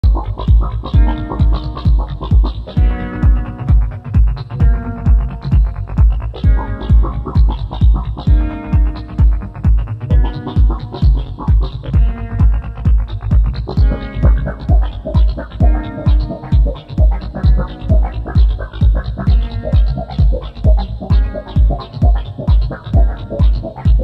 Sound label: Sad Techno